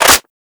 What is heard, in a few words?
ak47 clip in